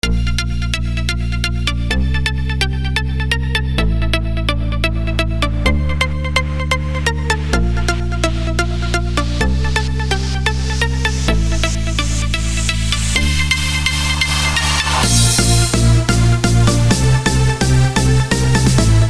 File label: simple house